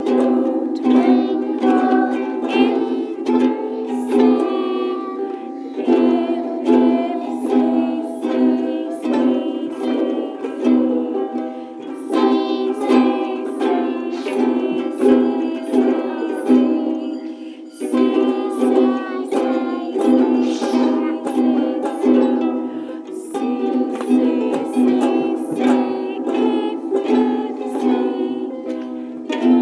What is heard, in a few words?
twinkle twinkle little star